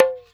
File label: TABLA HAMM DN, Indian perc; Spectrasonics - Heart of Asia CD2